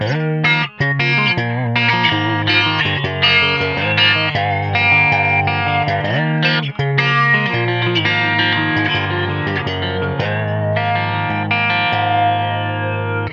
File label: phaser guit